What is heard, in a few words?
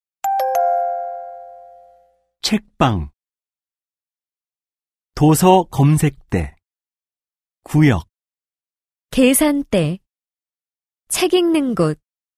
K-POP